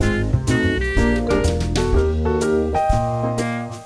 serving music; Sesame Street Ready, Set, Grover

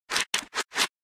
Scratch Trivia

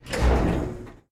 Pilon Servo Stop

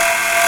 Platform move up loop

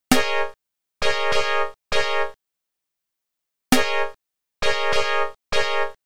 Opening Fanfare; Heroes of the Lance; MSX2

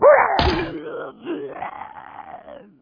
Monster; Conquer Online 2.0

